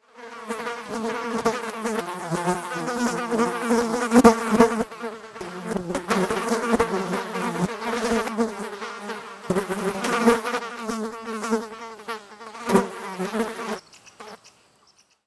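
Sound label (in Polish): bzyczenie owadow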